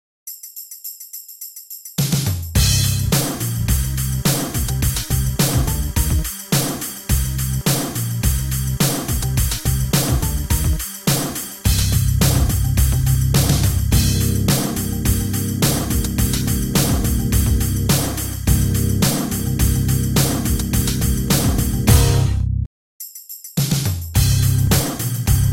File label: set korg factory